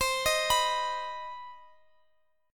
C Minor Major 7th, Guitar, Half Step Down

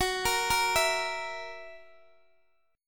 Gb 7th Gb down Guitar Open D, chord

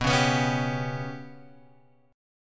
B Suspended 2nd Sharp 5th down Guitar Open G

